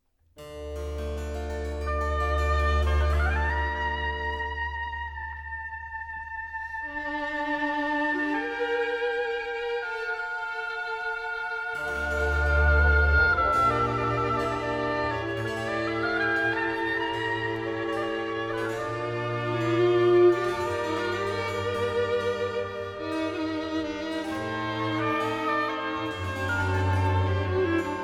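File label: Concerto a due chori in F major, Adagio; HWV 334; Handel Masterworks; Klassika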